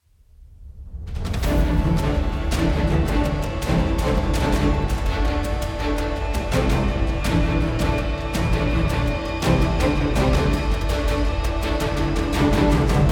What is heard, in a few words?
BGM combat mob enemy; Babylon's Fall